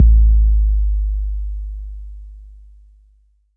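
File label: bass drum 808 Tape Decay F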